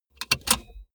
car bmw e46 interior safety belt clasp release hard